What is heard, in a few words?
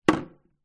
household soap dispenser plastic down on sink